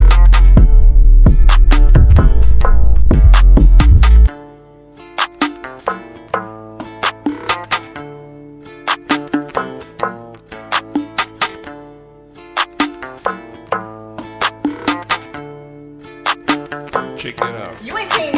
hip hop beat

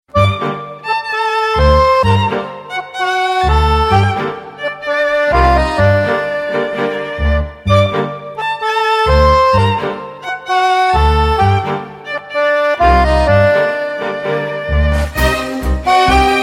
latin beautiful ring ringtone

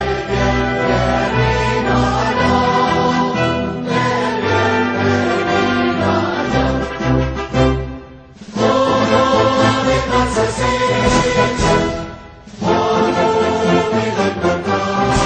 colombian national anthem starting

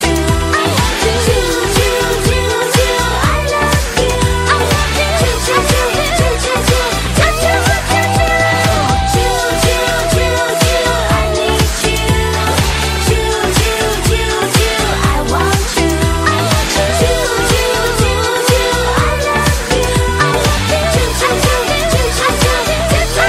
chinese ringtone

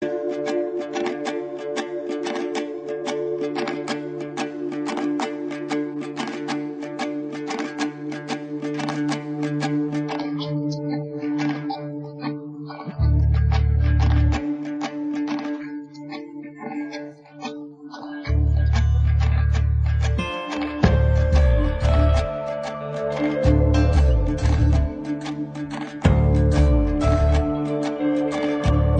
instrumental ringtone

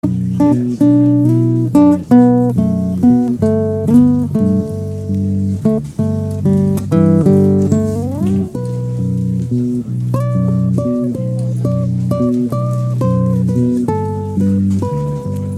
brazilian relaxation instrumental; ringtone

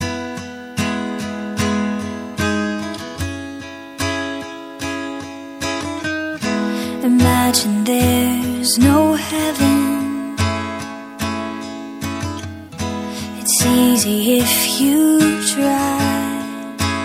spanish ringtone

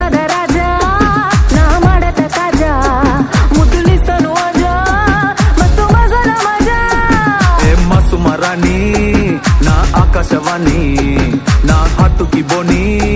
telugu ringtone; maari 2

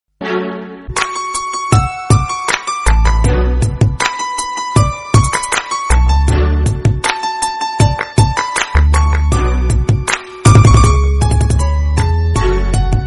soft piano; ringtone

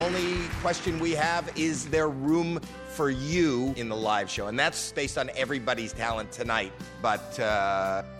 swedish original ringtone

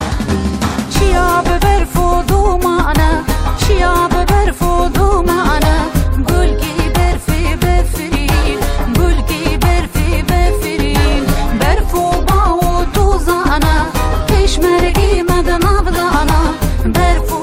peshmerga song

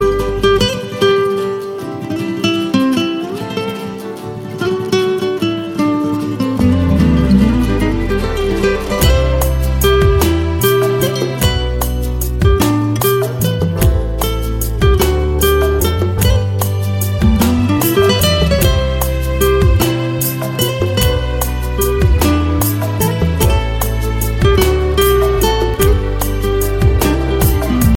love guitar; ringtone

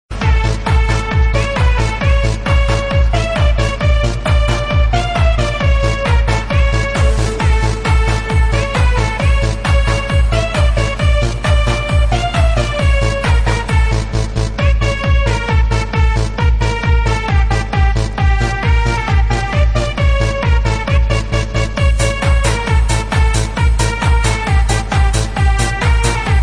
russian ring

